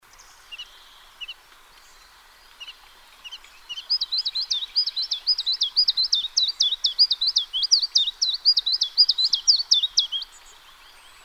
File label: song russia, tristis